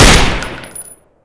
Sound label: hkvp70z fire